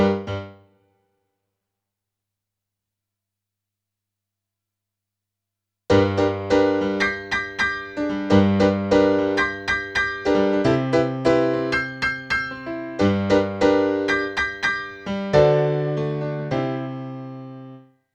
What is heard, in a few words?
PIANO, RNR for piano